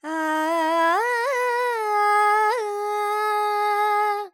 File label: Phrygian, Vocals, Carnatic Female, Alaps, Dry; Sample Banks